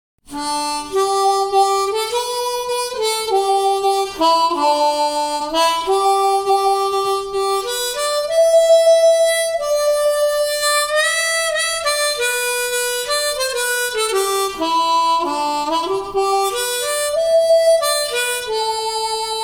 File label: solo, Mundharmonikas; Standard Mundharmonikas; Solo Stimmung - Melodie